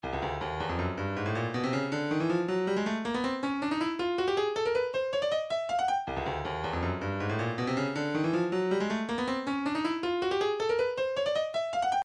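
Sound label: separated elements electric hihat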